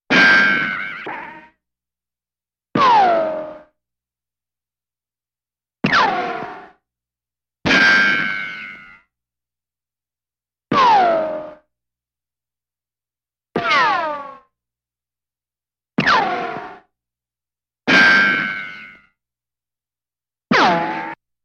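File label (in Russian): комический рикошет 9 выстрелов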